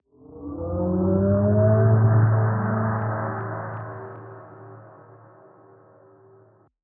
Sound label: Movement; 99Sounds; InterSpace